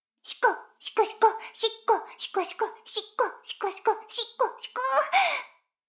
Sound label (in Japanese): シコシコ音声; 逆時間停止されて